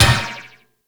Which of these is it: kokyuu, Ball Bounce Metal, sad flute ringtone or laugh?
Ball Bounce Metal